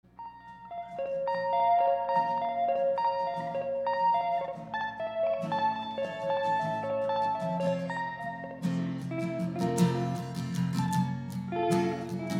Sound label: acoustic